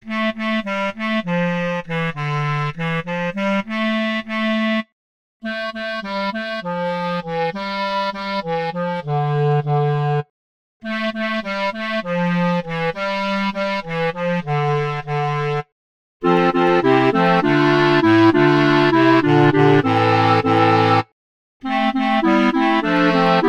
for clarinet quartet; anonymous riu riu chiu

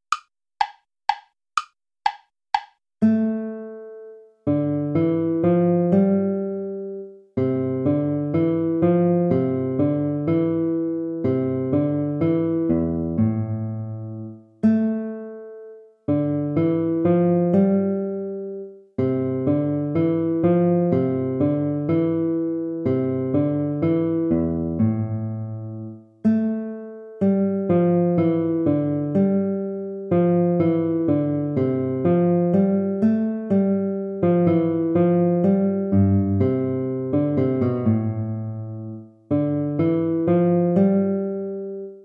minuet

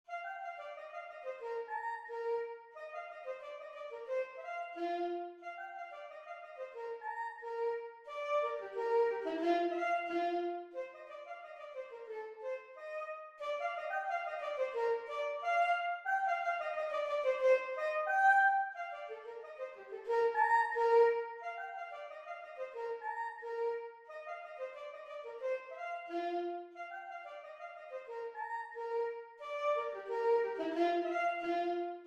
gavotte; gossec francois joseph

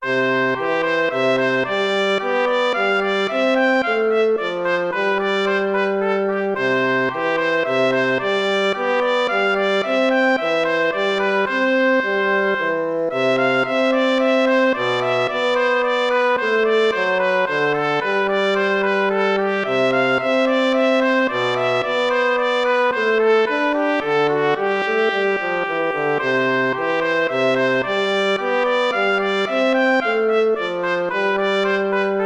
tempo minuetto; hook james

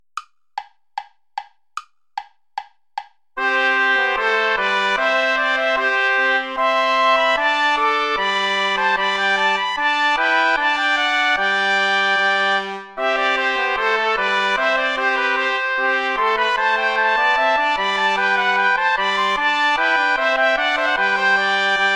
ballet play along without trumpet; praetorius michael; ballet iii

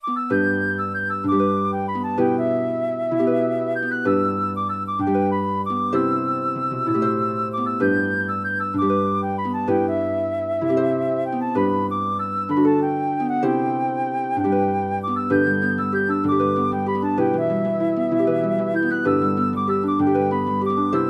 bonnie kellswater pour flute et harpe

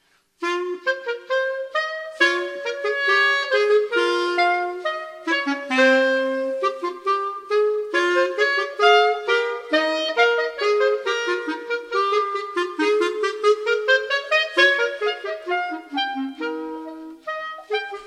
concert for flat clarinet

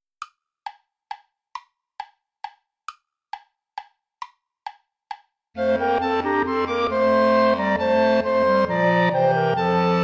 volta play along without clarinet; praetorius michael

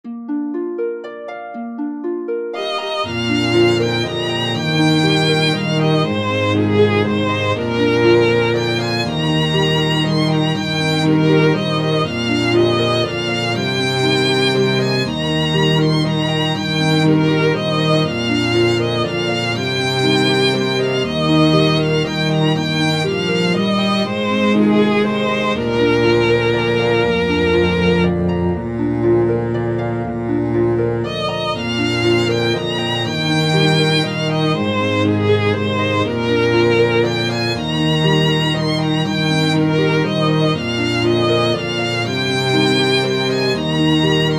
for harp strings

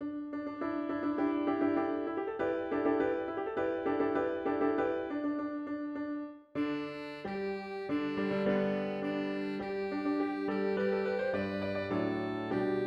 hymne national australie; mccormick peter dodds; advance australia fair

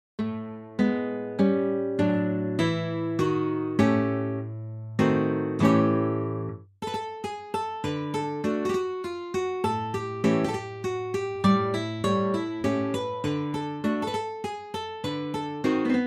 rustic dances

